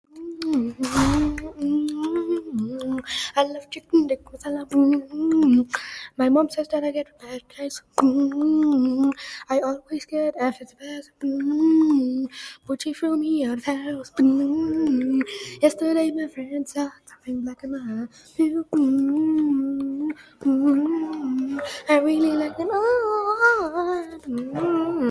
beatboxin

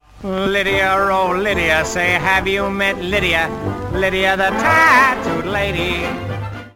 ringtone; breaking bad todds

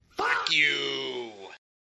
fahh

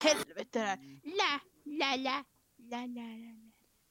lalala

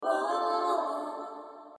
wooho

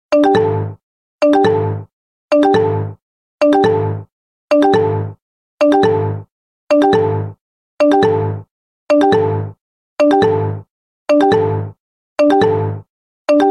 msn message sound

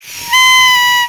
funny horn

